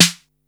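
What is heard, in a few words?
808 snare; 300 Drum Machines; Yamaha Motif ES-Series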